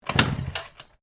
Motorcycle Kick, Transportation, Automobile, Motorcycle, Kick, No, Start; BlastWave